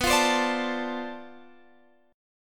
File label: D-Minor 7th B, chord; Dm7/B; strummed